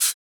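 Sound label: vox percussion, Vocal Planet Jazz FX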